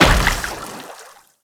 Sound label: Moa Combined Step Water; Enemies; Warframe